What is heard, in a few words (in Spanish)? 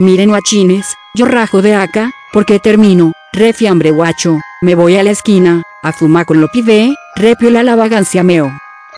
ritmo; uboa